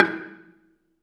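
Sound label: Balafon 2 E3, Asian Traditions; Soundscan vol.60; AKAI; BELAFON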